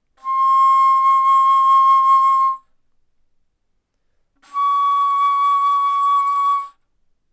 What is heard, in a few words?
Bass Flute C6 Db6; ff